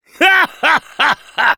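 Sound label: laugh happy, French; Engineer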